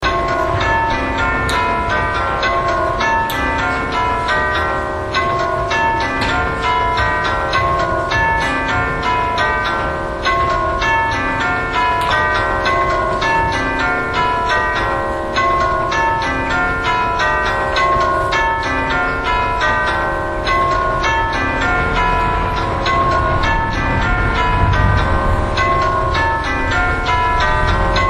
St Peter's tubular bells

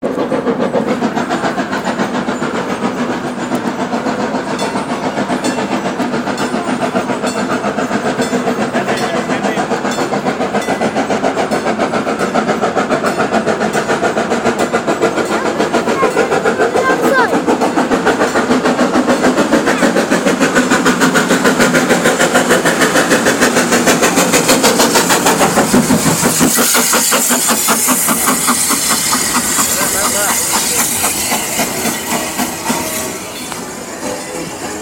hudswell clarke 0 6 0 saddle tank engine

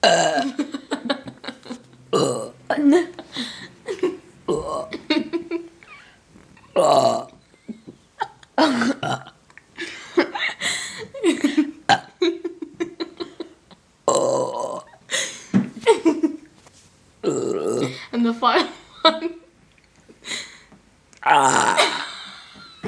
burp

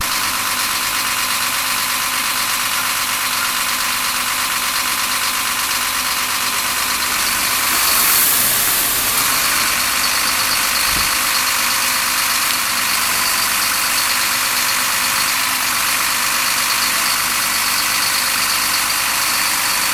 broken air conditioning fan

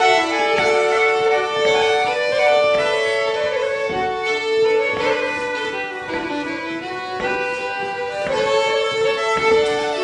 beautiful swedish music

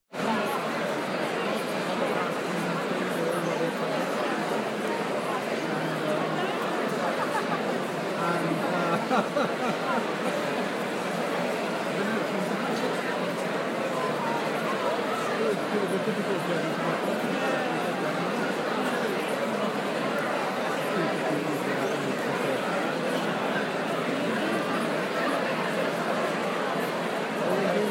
ambient preshow hubbub; at madeindagenham

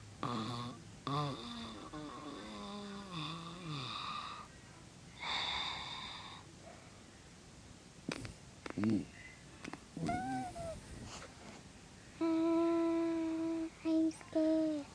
ahahah

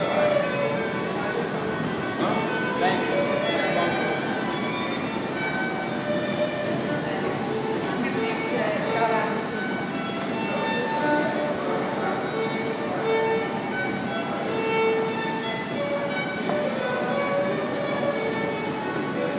violin at st paul s tube

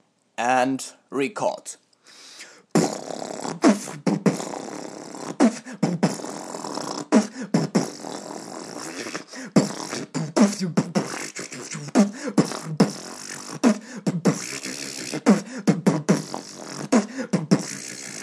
beatbox im dubstep style amateur

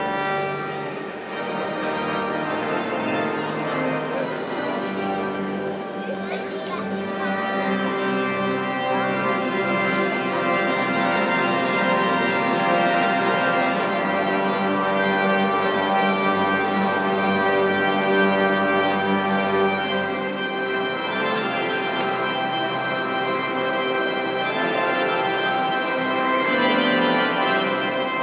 organ recital; superman; kelvingroveart

